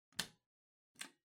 Light Switch Wide Panel, Wall Gentle

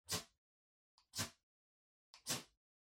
Flint Stick Far Strike

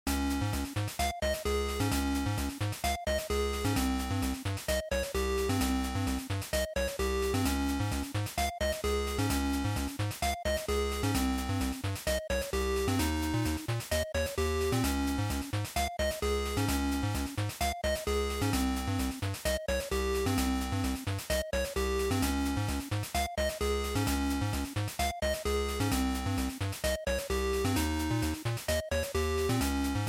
Action, Boss

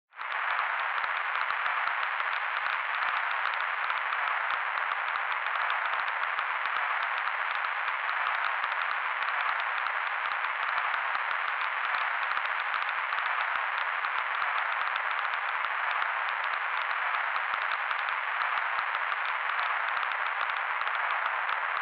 Retro Anime System

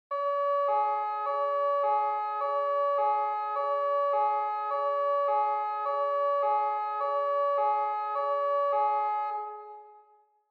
Police Car Siren Far

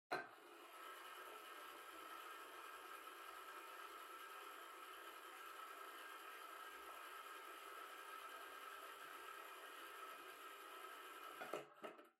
Pendulum Clock Chime Far Drive

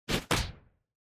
Motion Grab

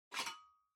Typewriter Far Open

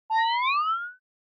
Anime Motion, Slow

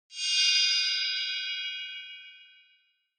Horror Accent Low Short

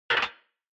Retro Anime Switch Low

